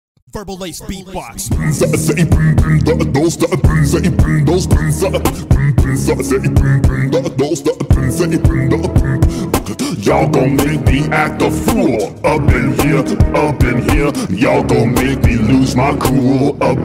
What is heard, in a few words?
beatbox; thanos